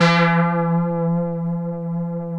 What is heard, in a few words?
Korg PS3100 G 3; Back In Time Records; Korg Universe VOL-1